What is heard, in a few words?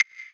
Percussive